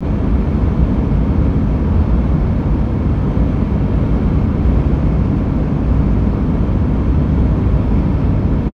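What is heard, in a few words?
HIGHWAY Engine, Car; VSI Car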